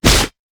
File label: pirate basic attack; LoL; gangplank